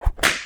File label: whip